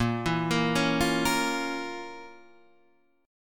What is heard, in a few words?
F Major 7th Suspended 4th Sharp 5th